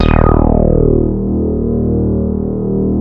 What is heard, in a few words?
SH 1000, BASSES